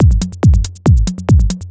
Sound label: hard beats 140, hard trance beats